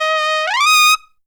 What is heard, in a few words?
T D# LSU, TRUMPET FX; Zero-G; Phantom Horns